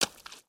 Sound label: Foot Swamp Water Run, footsteps